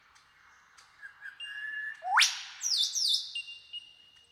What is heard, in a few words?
Superb Lyrebird Menura novaehollandiae